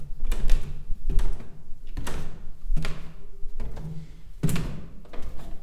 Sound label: Stairs